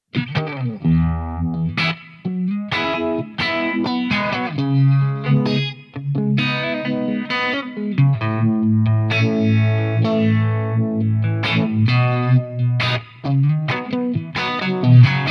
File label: Rhythm; ToneMasterPro; Summer Of Jimi